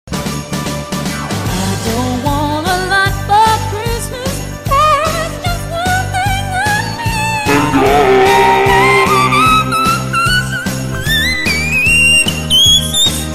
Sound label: cursed christmas music